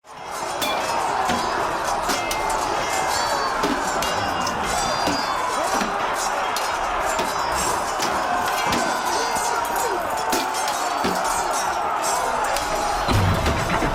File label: Siege Battle, Combat Ambience; 1 Hour; dnd